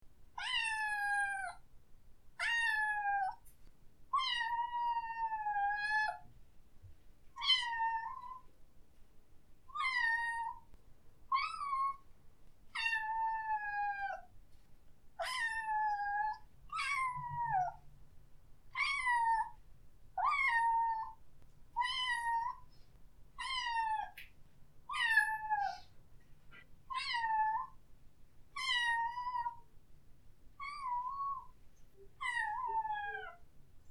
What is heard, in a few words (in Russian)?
miau, kotyat